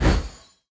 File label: Magic Transition